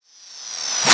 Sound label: FX Clap Reverse